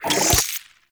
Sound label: Charge; Weapons; Warframe; Tenno; Wraith Sidearm; Epitaph Prime